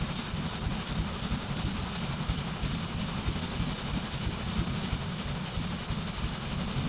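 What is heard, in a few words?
fabrication Conveyor loop; machines mechanical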